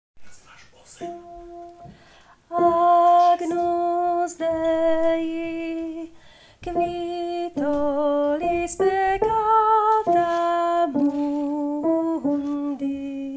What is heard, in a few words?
Agnus Dei alt; Agnus Dei 2024; spevokol